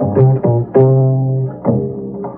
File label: bass